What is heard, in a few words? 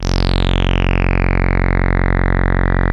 TAURUS A2, synthesizer